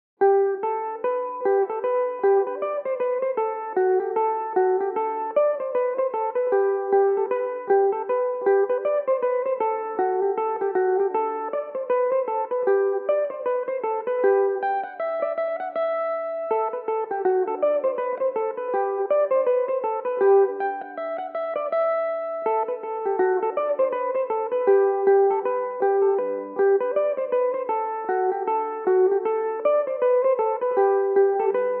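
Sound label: G major, Irish mandolin; Bang It Up; Belmuse EM-190 electric mandolin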